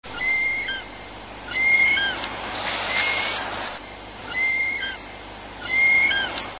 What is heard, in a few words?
brown kiwi male